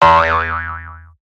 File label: tramp bounce; cstrike